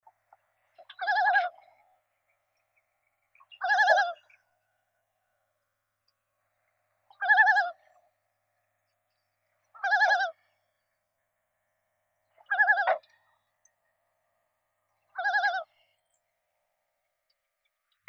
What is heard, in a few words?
Loons, Christie lake